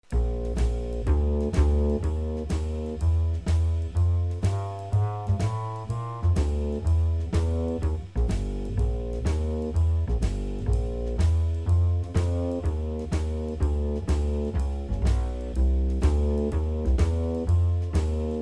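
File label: Bb Blues, Ear Training; bass and drums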